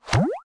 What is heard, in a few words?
Splat